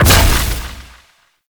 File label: Shield Bash Impact; Vehicles; Abilities; Thano Mech; Warframe; Nechro Mech